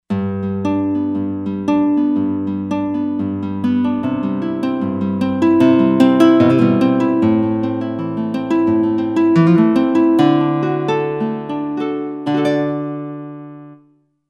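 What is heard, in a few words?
Nylon Guitar, Roland Fantom XR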